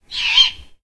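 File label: rural random screech owl; random amb sfx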